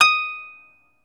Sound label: CELTIC HA, Celtic Harp